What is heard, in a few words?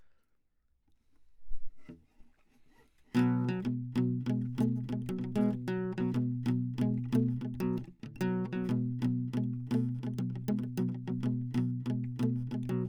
Classic Guitar